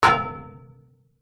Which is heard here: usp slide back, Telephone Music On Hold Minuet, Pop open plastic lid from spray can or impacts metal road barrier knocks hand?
impacts metal road barrier knocks hand